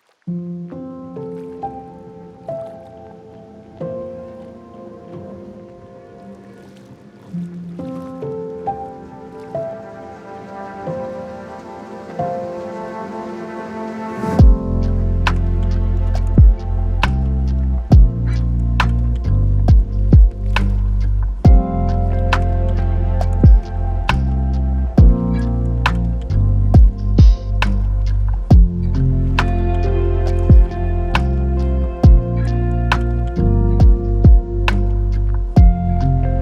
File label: lofi hip hop